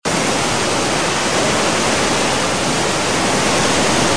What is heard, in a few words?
waterfall